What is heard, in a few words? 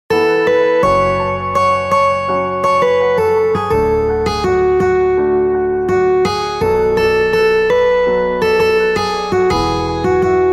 ringtone, karaoke, bollywood ringtones